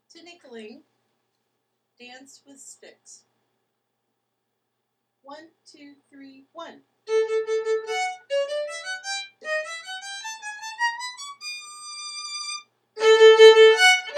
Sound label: Tinkling easy violin